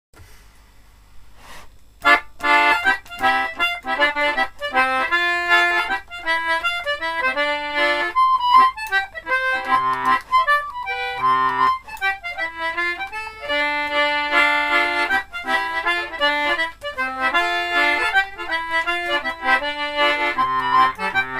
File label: greensleeves, concertina; faking examples